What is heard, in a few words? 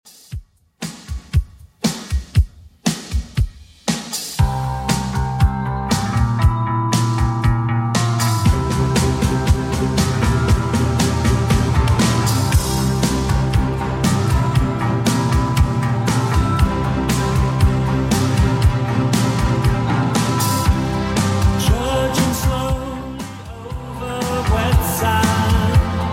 80s Redrum 118 bpm